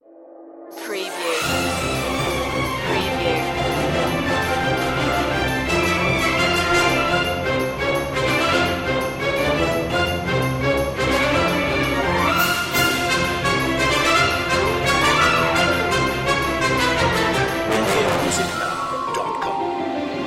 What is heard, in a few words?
no choir